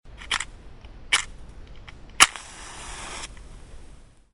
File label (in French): Allumette; bruitage; Electro-menager